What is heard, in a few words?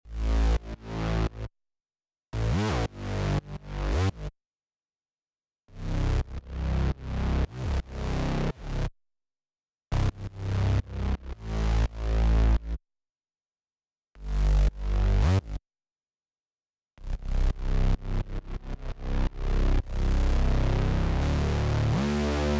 bass